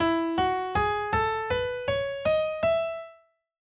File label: Major Scale E